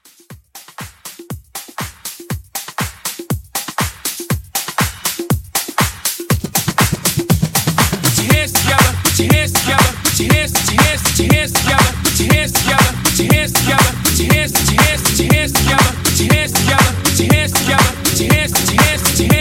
Hype 120

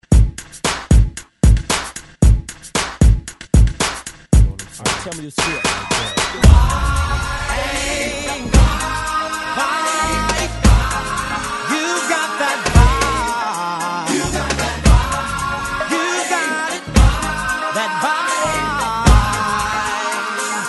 Remix 114 Bpm; DJDOLLS

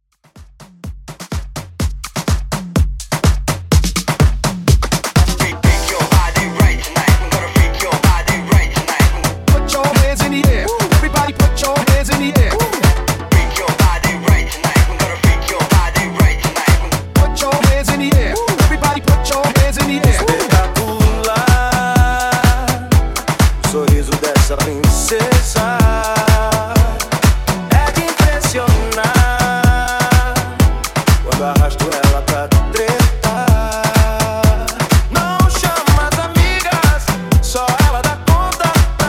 Afro Hype Redrum 125Bpm

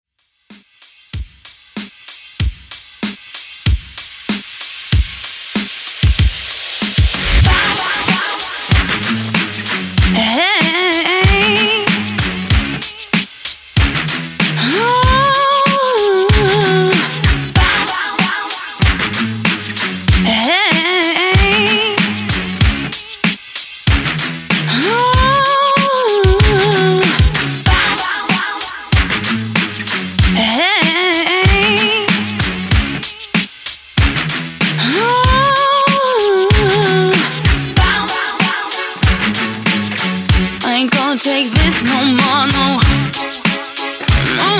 Bounce Workout Redrum 95Bpm

